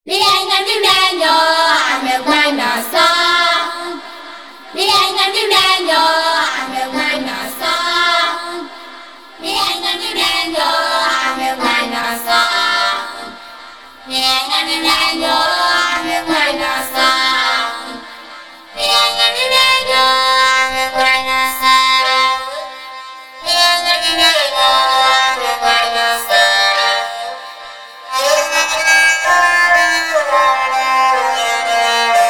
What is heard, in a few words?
filter loopmorph; prosoniq morph vst